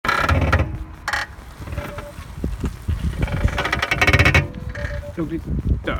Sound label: suspension groaning